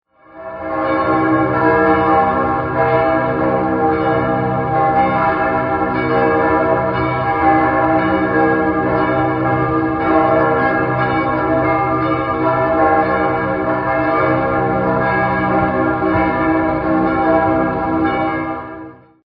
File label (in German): Kathedrale